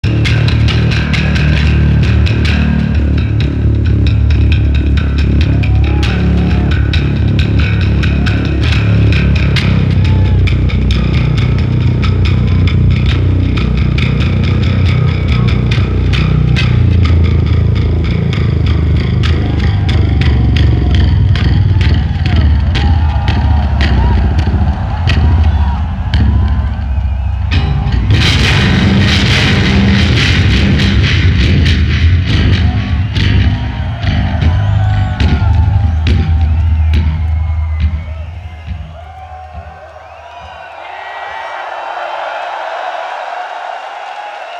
Bass Solo

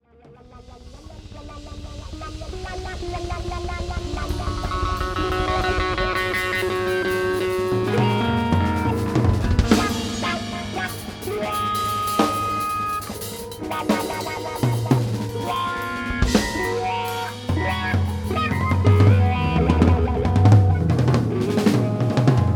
Tuning

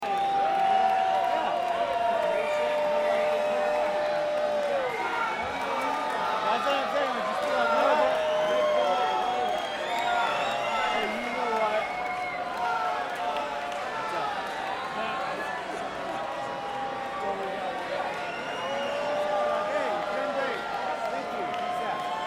Crowd

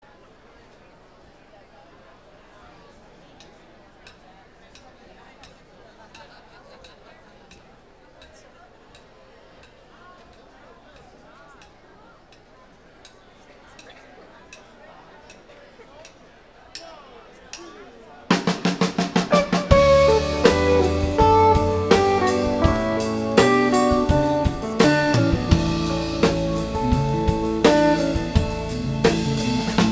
Girls Drums

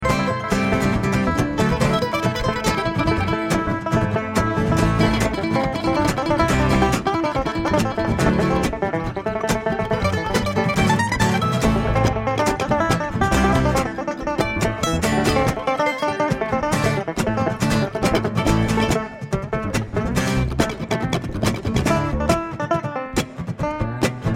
Traffic Jam

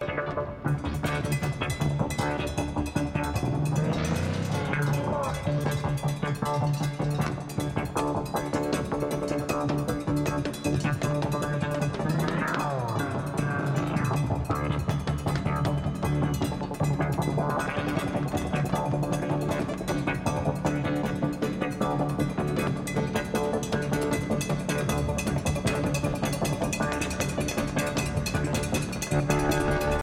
Drums

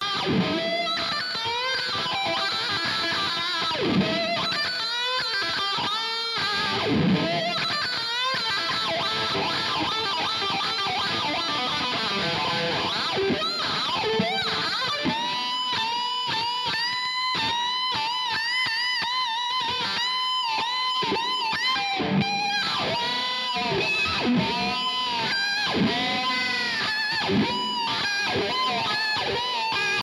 Guitar Doodle

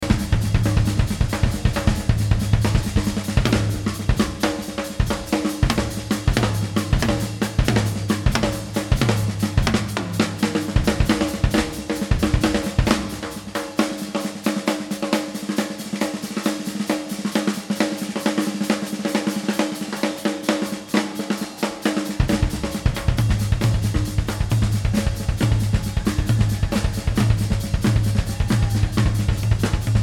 Drums

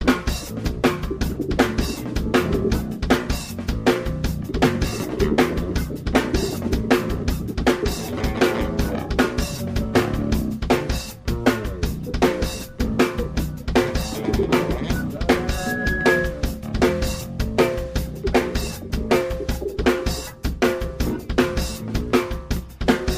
Improv Jam

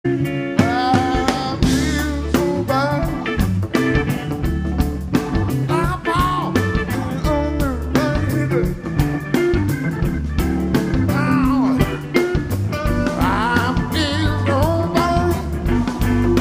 Funk Blues In D

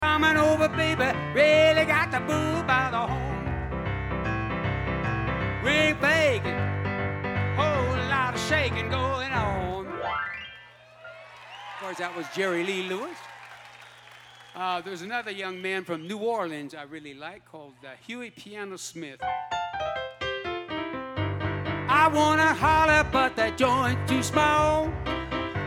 Piano Moment

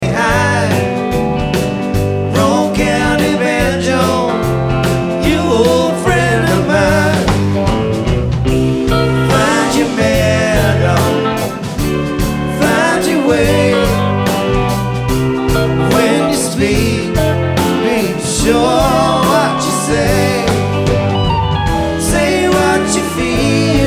Banjo

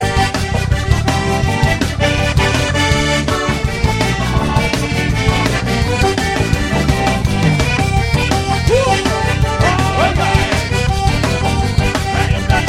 Instrumental

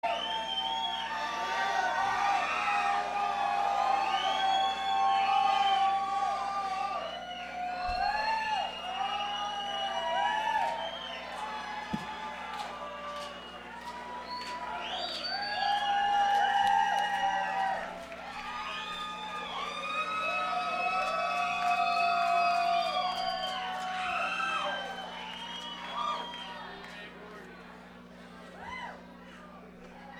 encore applause

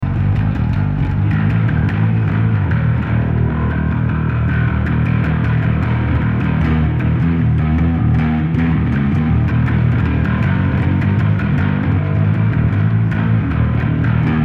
Bass Solo

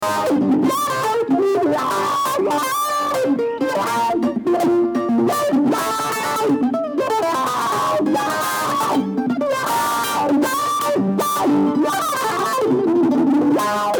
Kentucky Mandolin

